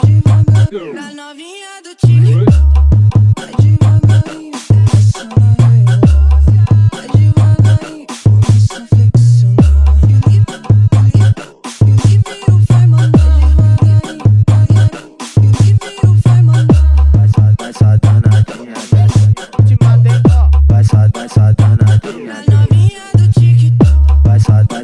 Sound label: funk bass